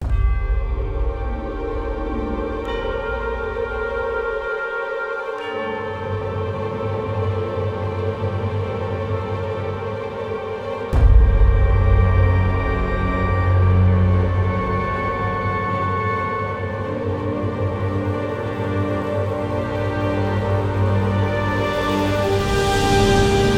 witch music

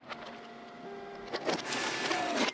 Photocopier Print

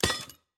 Tongs Iron trap Take

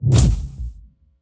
Monster Generic Foley Eat Human; gamerip; Rampage

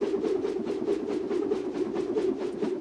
swords Spin Loop; enemies

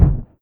MAGIC SPELL Flame Mono

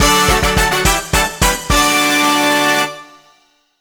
Build Landmark Trumpet; machi koro with everyone; switch windows

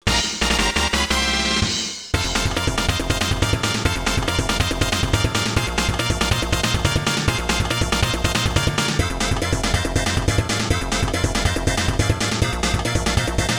Mini Game Victory; Kirby the Crystal Shards

